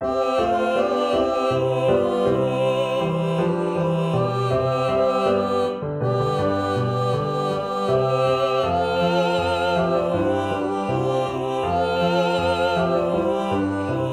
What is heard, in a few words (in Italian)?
Quartetto; Nei campi e nelle selve